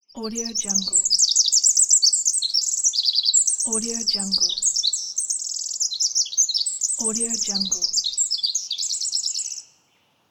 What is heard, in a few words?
pure bird singing